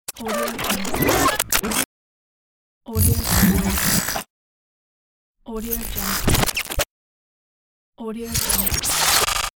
robot sounds of the future

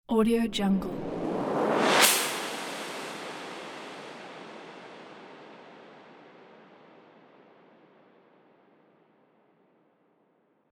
magic spell effect

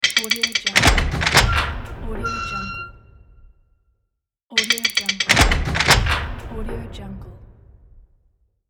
open bank vault

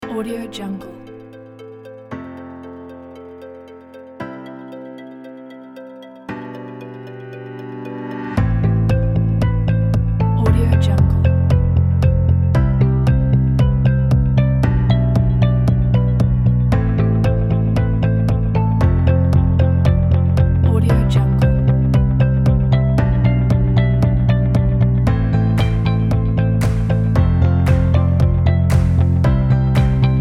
ambience corporate pack

